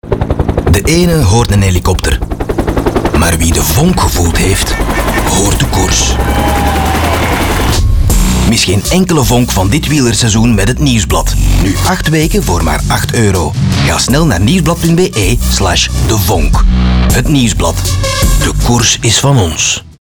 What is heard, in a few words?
Helikopter